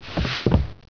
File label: wood roll; hereticiidemo; player